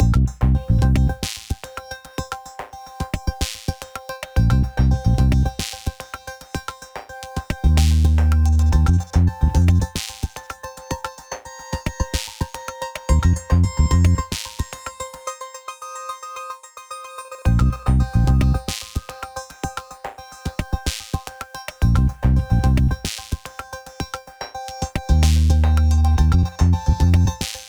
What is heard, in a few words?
sparce loop; Asteroids; stock mus sparce loop